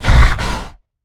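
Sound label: Stealth Attack Grapple; Evolve; Goliath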